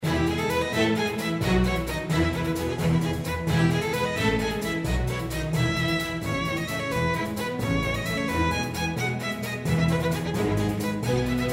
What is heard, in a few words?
Classical